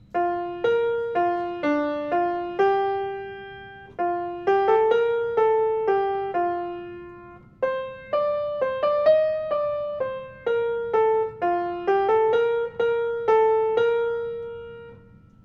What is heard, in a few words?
MELODY; Festal Song